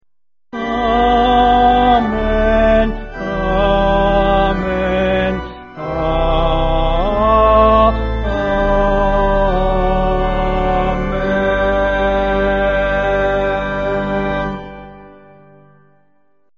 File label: Threefold Amen Danish